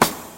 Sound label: airy sizzle steel snare drum sample a sharp key